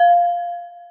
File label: celesta, programmable speaker